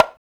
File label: TONG, African Perc; Spectrasonics; Supreme Beats; African Contemporary; Afro DRMs